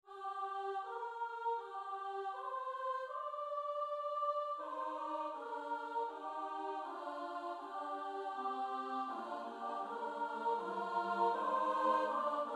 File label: midi; twardowski; na polu wierzba